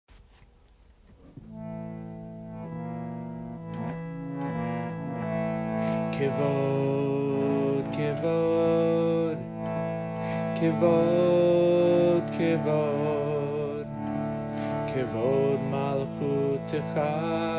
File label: kaf chant